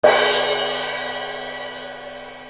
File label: CYM